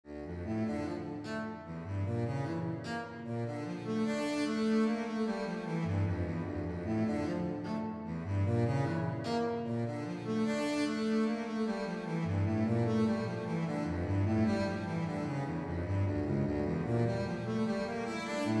cello alto music

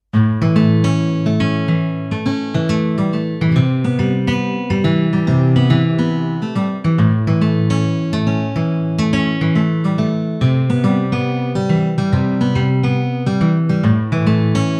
ARPEGIO FINGERS

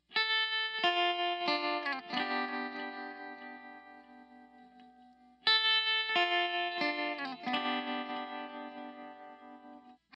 guitar bridge

